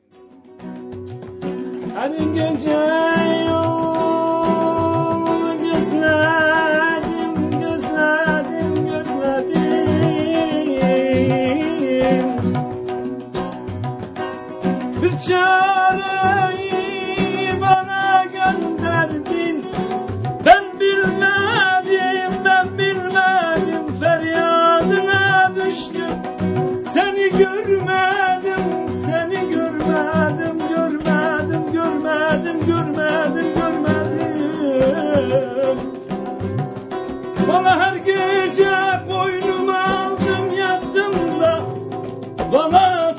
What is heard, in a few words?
Turkish Flamenco; EthnoSuperLounge